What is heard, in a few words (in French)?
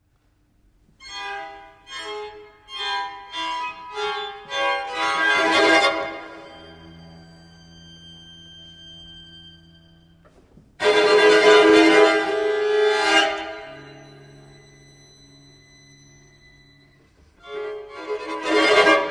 corde